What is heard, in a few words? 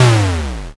explosion s